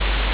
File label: ambience room tone